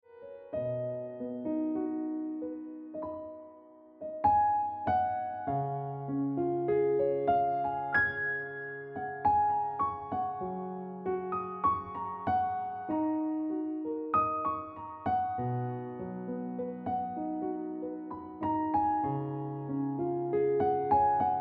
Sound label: Ringtone Piano Music